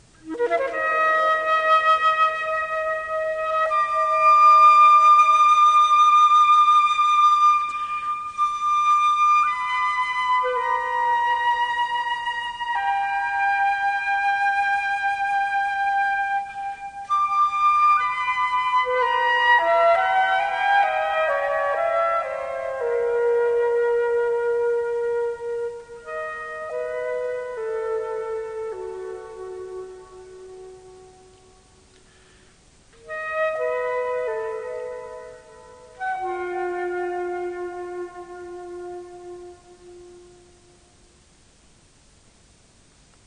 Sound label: solo for flute